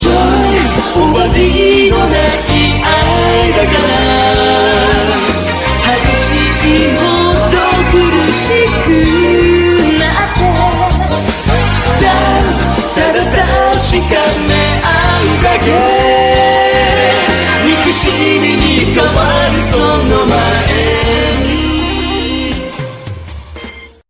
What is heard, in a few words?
distotion